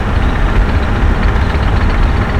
heavy tank idle; 1944 Battle Of The Bulge

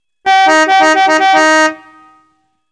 Beep beep beep

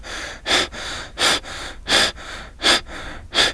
breath run; The Sum of All Fears

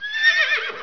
whinny; Exile III v1.0

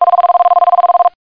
Cell Phone Ring

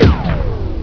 Missile; Dreamstars

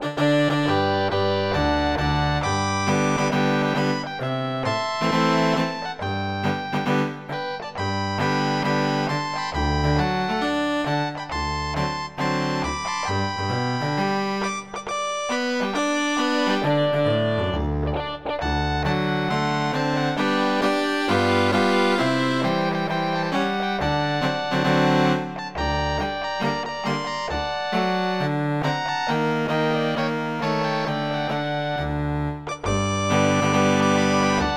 french, folk, midi

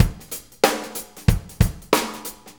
Shuffle Loop, drum; drumtools

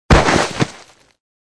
Character Fall; Survivor The Interactive Game

